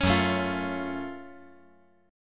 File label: Gb Minor Major 7th Gb down